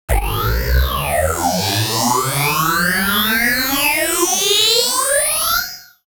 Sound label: FX UP; neuro; Stanza